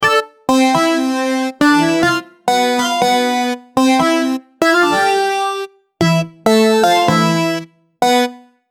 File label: a short jingle for when, game show